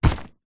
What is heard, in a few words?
luggage bag leather old metal trim buckle grab; zapsplat